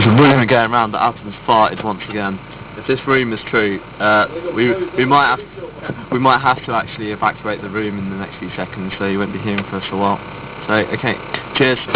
farting

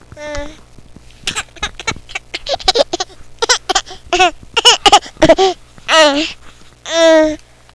giggle cry

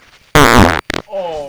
fart

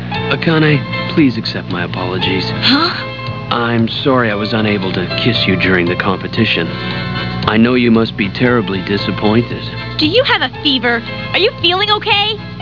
kiss

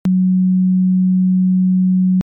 sine

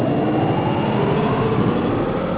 sound warp

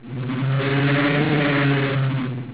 alien noise

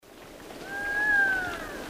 Brown Wood Owl

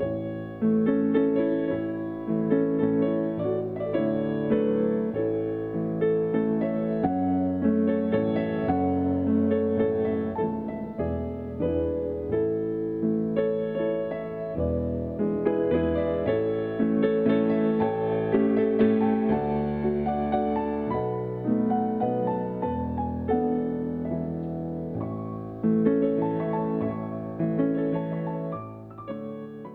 Brahms valsa

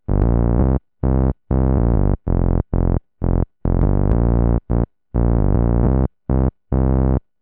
bass patch

